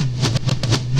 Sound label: Fx Vox; Equipped Music; Loungin House